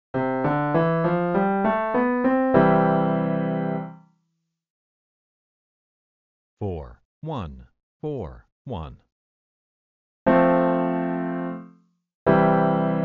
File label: I, IV; progressions